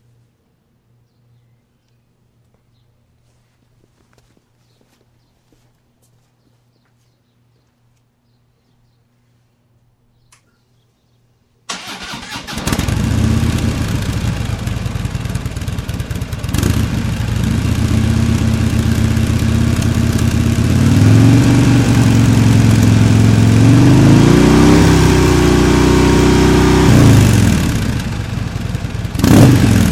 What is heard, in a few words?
FLHTK Stock Exhaust